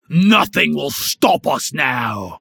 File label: Bar Cheering when drinking